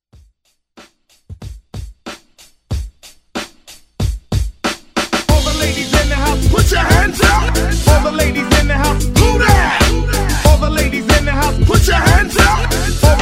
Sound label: Reggae Redrum Hype